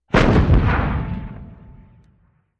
105mm Howitzer: Fires, Close-up, Downrange Expl

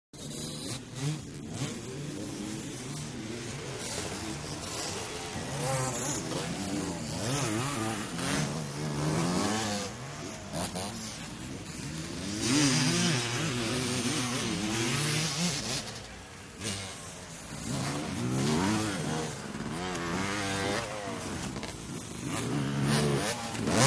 Motorcycle 80 Bys, motocross

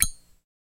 Zippo Lighter Open

